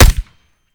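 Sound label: akilo47 suppressor player, weapons; viper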